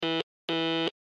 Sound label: Morse Code A Letter; Blastwave